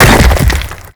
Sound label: rock fall break